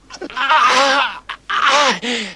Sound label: CRYING OUT IN PAIN